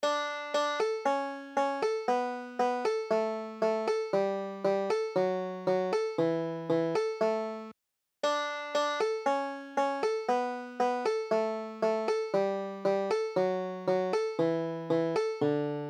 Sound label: tiddle took todfish, banjo